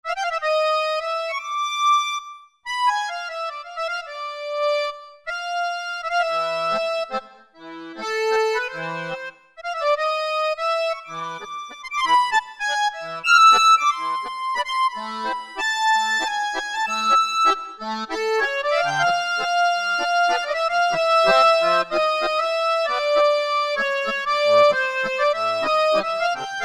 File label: Musette tun